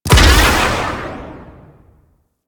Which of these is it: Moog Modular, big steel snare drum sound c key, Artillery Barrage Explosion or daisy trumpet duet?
Artillery Barrage Explosion